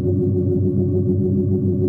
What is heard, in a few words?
hum; planetoid